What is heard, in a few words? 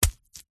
horror gore impact fresh fleshy guts bloody punch stab